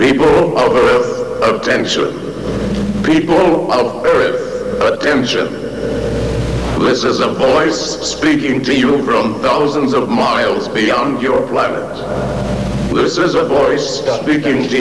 people, aliens; q3rally

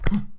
jump, player; nzp

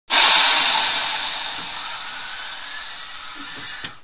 steam burst, ambience

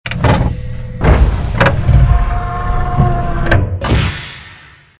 lock door, ambience

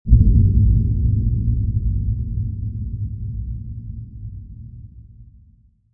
low hit; ambience

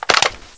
top open, weapons browning; nzp

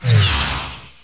buy; nzp

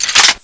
bolt back, weapons; browning; nzp

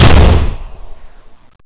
explode, grenade; weapons; nzp

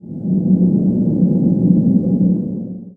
wind; ambience

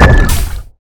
plasma; ParoxysmII; weapons

